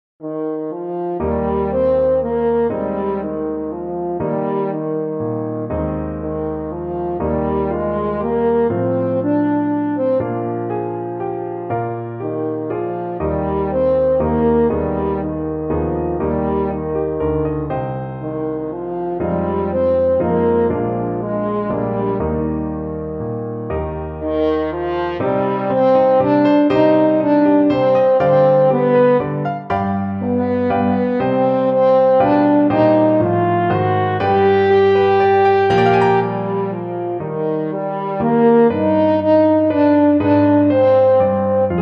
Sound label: vieni sul mar, french horn